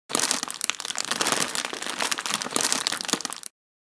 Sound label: Plastic wrapper crackling; gfxsounds